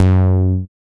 TITE BASS; Masterbits; Dance Trax

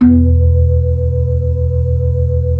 B-3, Blues

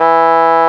OBOE E3

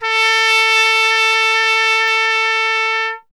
TP A; Miroslav Vitous - Solo Instruments VOL-1; Trumpet

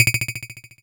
Lop Brick, dry perc; Quasimidi Rave-o-lution 309

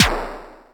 Pit 606 Sn, dry perc; Quasimidi Rave-o-lution 309

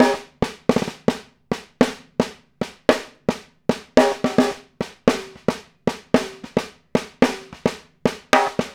Snare Pattern, Drums; Essential Percussion

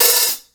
Hi Hats; InVision Interactive; Lightware VOL-1

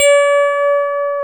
KEY EP1 HRD, MKS20 + EP; Roland L-CD701; Pop Pianos 3

